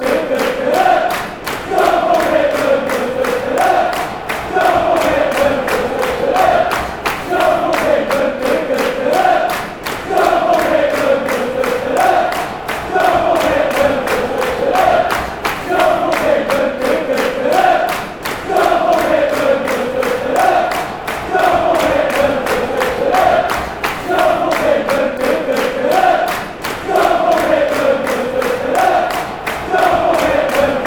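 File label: fanchants, football chants; wycombe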